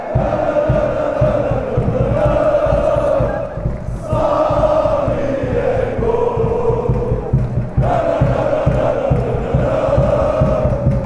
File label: nananana slavie gol, fanchants